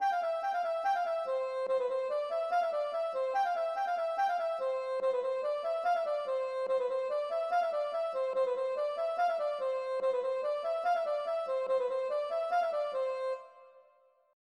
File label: polka double